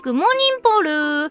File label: polka